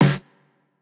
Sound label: Jrock snare